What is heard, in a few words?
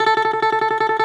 balalaika, Russian strings